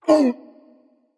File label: ouch berserker; cuchulainn